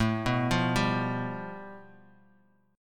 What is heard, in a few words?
A 7th Suspended 2nd Sharp 5th, Bass Standard; A7sus2#5 arpeggiated; JGuitar